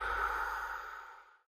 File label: quick stop; netent; magicportals